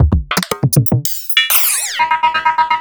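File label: Roland MV8800 drum HYBRID KIT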